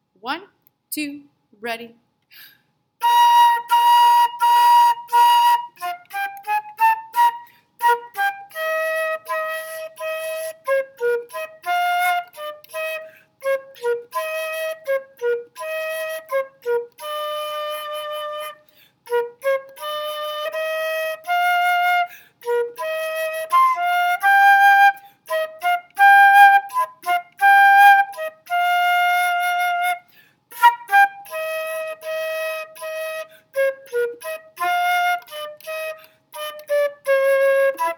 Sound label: gof flute